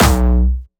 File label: B1, Jumpstyle Kick; Bassdrums; Jumpstyle Multis; vengeance essential club sounds-3; VEC3 Bassdrums